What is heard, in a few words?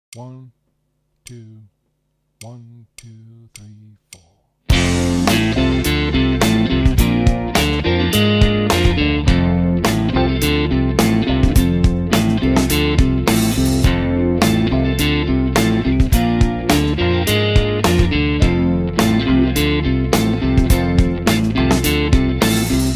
Backing for Lead; Play Rock Guitar - Ed Wennink